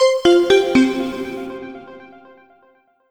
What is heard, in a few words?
gameover, snake